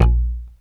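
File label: fretless bass mute A; AKAI S6000 CD-ROM - Ultimate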